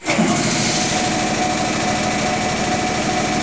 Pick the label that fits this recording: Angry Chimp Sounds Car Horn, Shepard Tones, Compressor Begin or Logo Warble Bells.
Compressor Begin